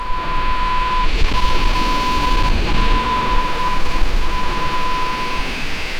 urbanistic lo-fi texture

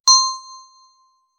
bell beep nice soft happy

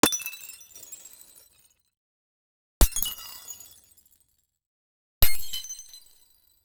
breaking glass ps1 style

